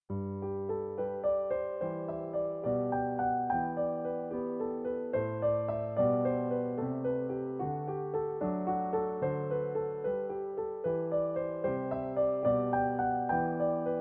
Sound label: piano